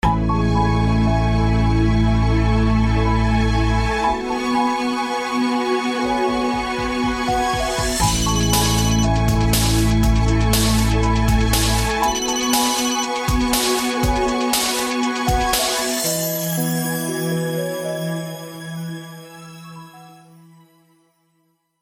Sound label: ringing